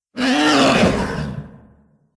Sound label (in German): grottenschart schrei